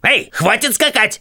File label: Twitch Drums; gamerip; The Princess and the Frog; Wii, Windows